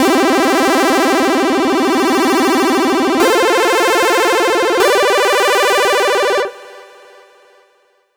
8-bit retro major c4 150 bpm

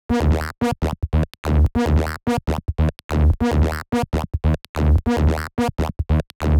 bass

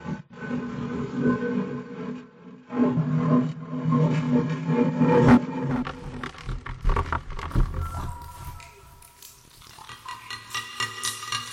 crypt echoes wet

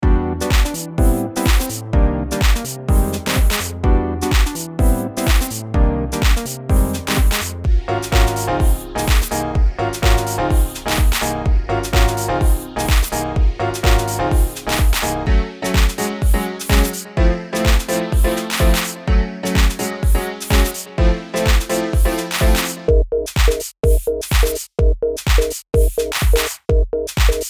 chords; backto95 v3

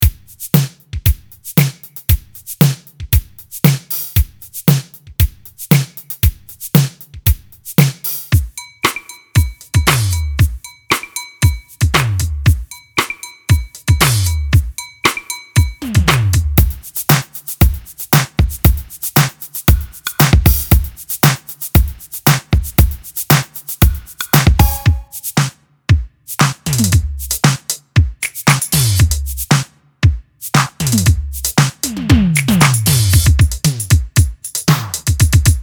urban funk

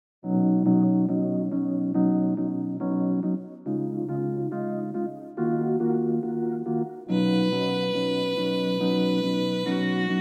strings violin; captains strings

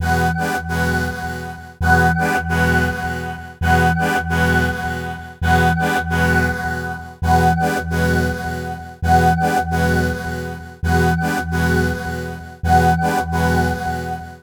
dash 133 bpm

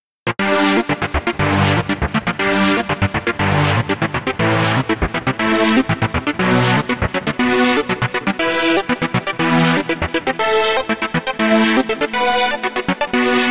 polaris filter

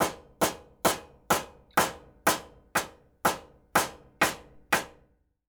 construction metal impact

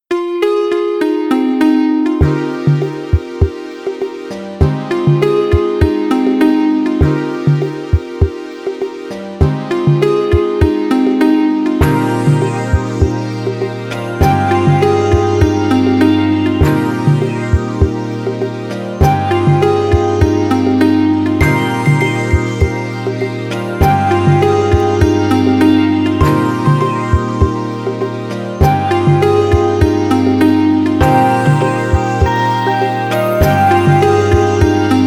composite keys

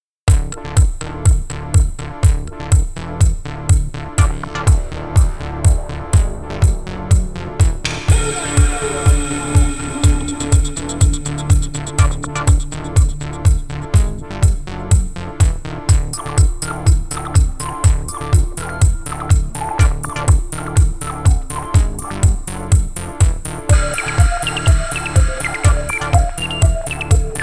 electromotion

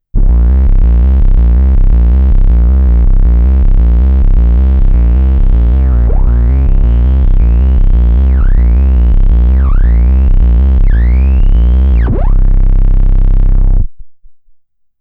fat the bass

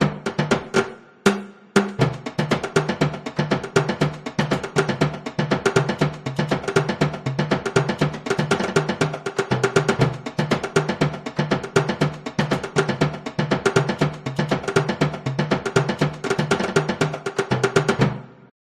latin american timbales; pai

